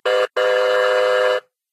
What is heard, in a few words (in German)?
sirene; minecraft